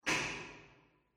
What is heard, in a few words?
warehouse brick drop; ambient, rocks